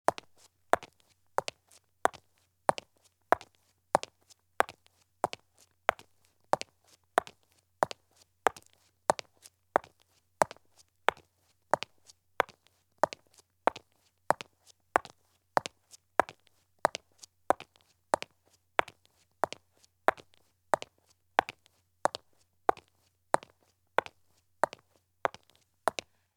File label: Women's Thin High Heel Shoes Walk at Fast Pace on Concrete; Sound Ideas; Footstep Sound Effects